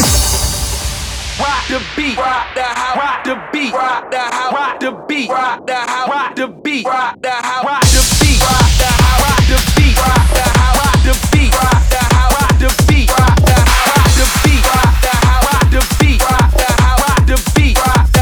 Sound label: TECHNO Style; Essentials; Cyber Beatnation 3 Nonstop Best Conclusion